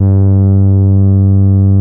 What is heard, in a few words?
Taurus, Moog Taurus; Greytsounds VOL-1